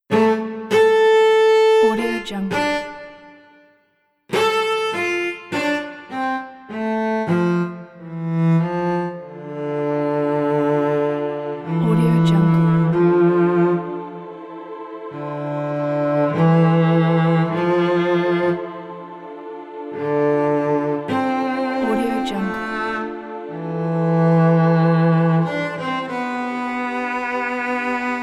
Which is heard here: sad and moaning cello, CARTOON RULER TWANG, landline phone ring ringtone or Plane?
sad and moaning cello